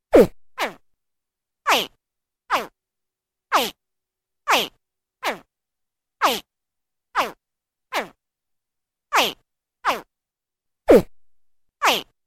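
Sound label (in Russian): детеныш крокодила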